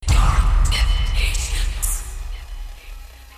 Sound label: vocal